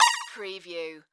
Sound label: Multimedia Beep